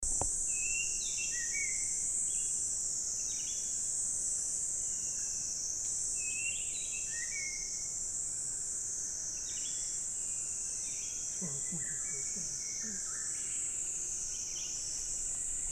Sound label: Abbotts, vietnam birds